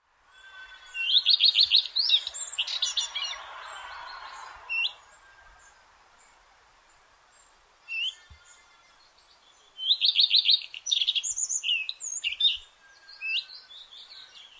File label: redstart